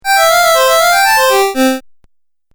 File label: Game Music Phrase G, video game